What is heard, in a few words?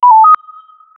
user arrived notification; arrival